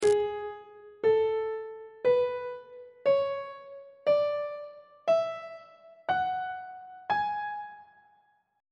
g sharp locrian mode note positions on treble clef